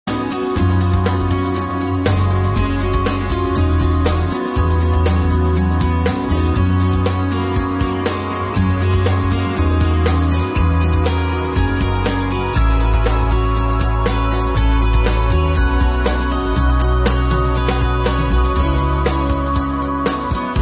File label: Acoustic; Shadowfire